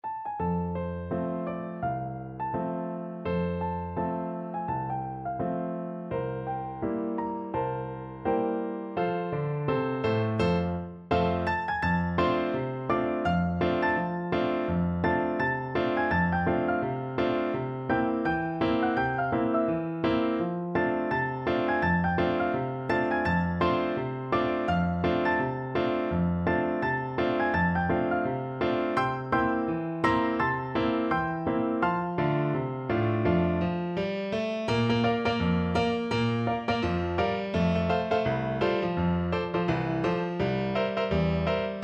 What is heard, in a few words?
down by the riverside, piano duet